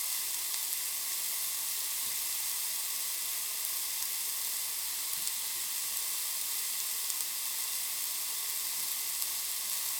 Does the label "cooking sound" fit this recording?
Yes